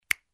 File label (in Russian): zvuk schelchka palcami